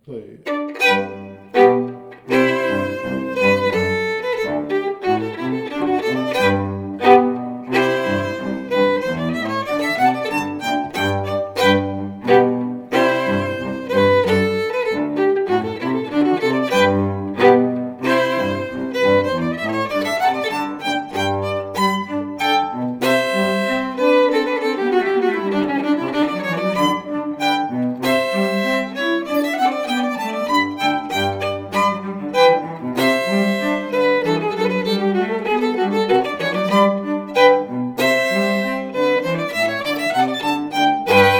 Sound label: Hornpipe FIDDLE CELLO; Jink & Diddle Book o' Tunes 2021